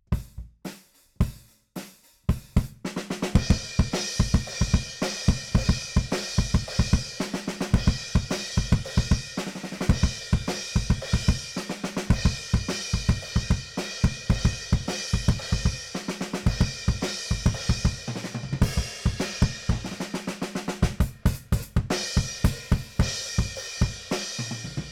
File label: BD Out